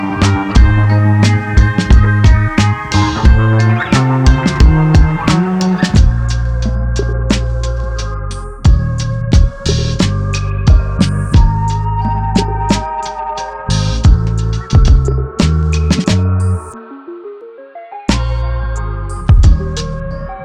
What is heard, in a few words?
Instrumental